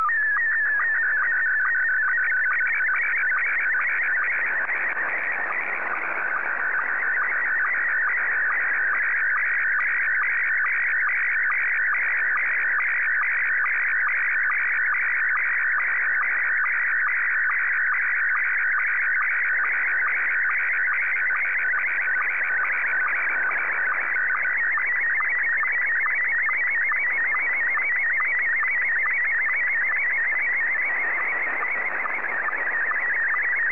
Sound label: sstv; VK7NMH